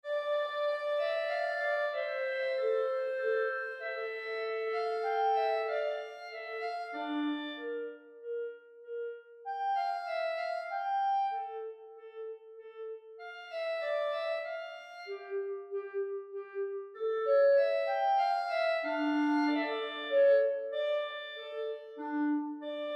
menuet en re mineur

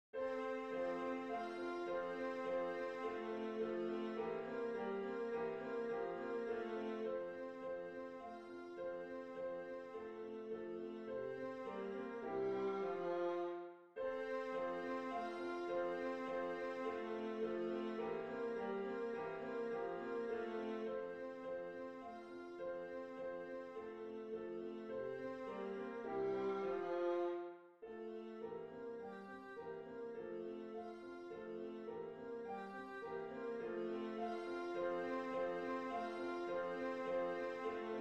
danse allemande; beethoven ludwig van

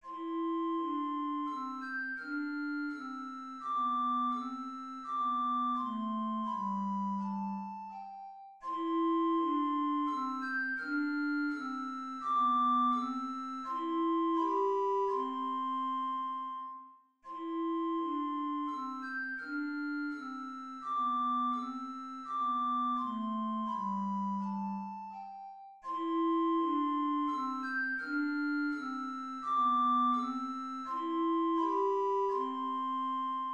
menuet majeur; bach johann christian

